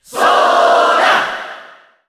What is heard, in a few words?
Cheer Dutch & English; Sora; SSBU